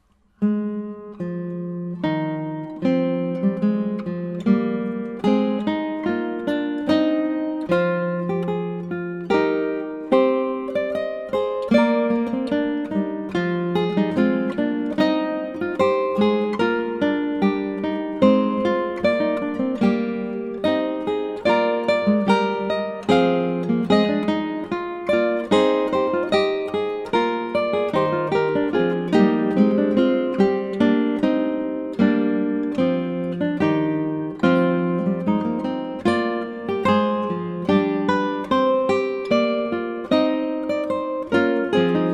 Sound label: Canzona in C minor; Diego Ortiz